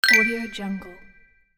collect; robotsound

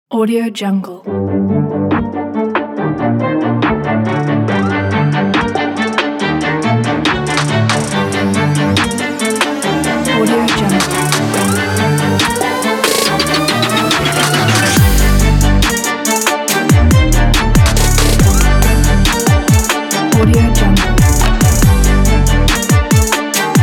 beat

